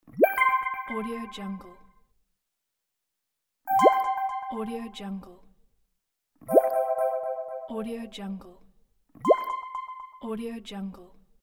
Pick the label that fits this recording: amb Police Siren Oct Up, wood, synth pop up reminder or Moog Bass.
synth pop up reminder